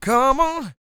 SING W, Vocal Planet Blues & Soul, BLUESY; ILIO

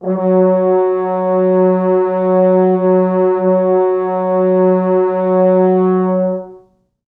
4 FrHorns

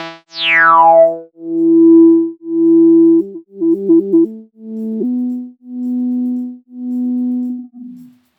SYN, Roots Funk; USB Soundscan vol.41; AKAI

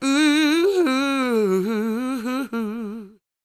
CROON, Soul

